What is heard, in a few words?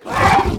attack